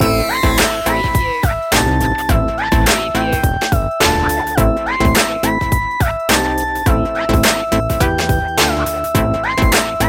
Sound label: hip hop chicago loop